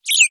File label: nestling, message tones